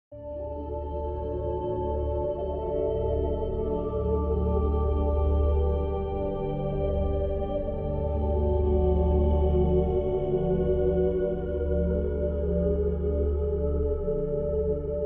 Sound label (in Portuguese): musica